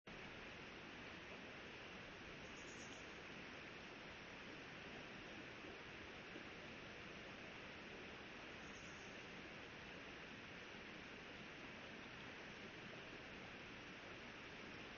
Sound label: riverside